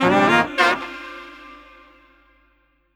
SAX, Drum & Bass, 150 BPM; Ueberschall; Drum & Bass Resonance

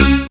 syn c#, Dance Synths Stabs & Hooks; vol.37 - Dance Synths

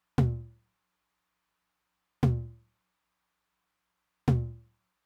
mid tom with, Roland TR-909; Roland TR-909 Ableton Project

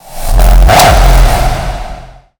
RAW FX KICK

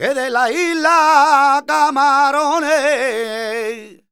voc f, Flamenco Vocals Male Cantaor

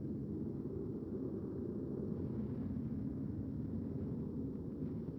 Ambience Loop, outdoor

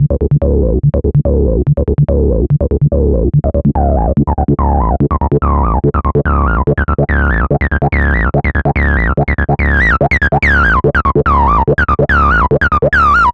Bass; Sonic Foundry (Sony Creative Software); Machine Language

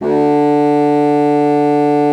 D3, bassoon; Xsample VOL-6

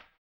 HAMBONE, Vocal Blues Soul; Spectrasonics Vocal Planet

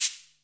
shaker; Zero-G; Total Drum Bass; Drums Percussion

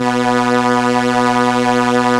STR ARP, ARP Strings, ARP Slow Str

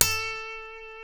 B, funk guitars; Spectrasonics; Hans Zimmer Guitars VOL-1; TAKA FUNK

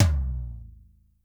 REAL T1; Crypton - DrumBase5000; R70 KIT #1

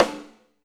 Snare, Alesis DM5

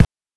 BANK1 BD, NES DPCM; MIDINES

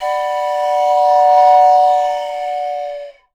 TRAIN W, WHISTLE; Voice Crystal - Steve Reid Definitive Percussion; ASRT WHISTLE

